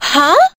"huh"

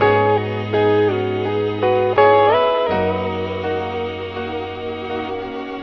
guitar, Sad

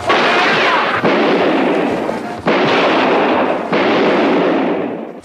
gun shots; Crash Bang Boom

